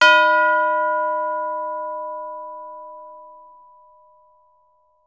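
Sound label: tubular bells B4; Advanced Orchestra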